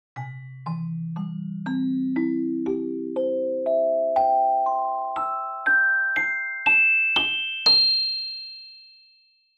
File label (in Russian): Chelesta